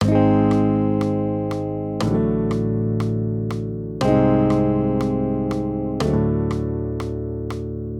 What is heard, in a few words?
maj triads; Ab-D; accompaniment